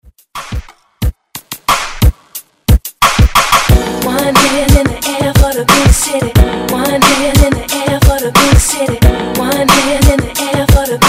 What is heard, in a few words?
club redrum